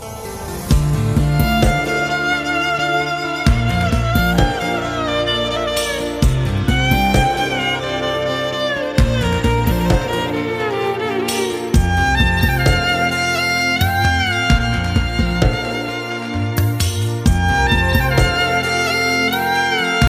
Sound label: violin cover ringtone